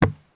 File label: sprint wood; Escape from Tarkov